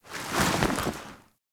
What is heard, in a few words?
cloth; Ambience; Warframe; Grineer Settlement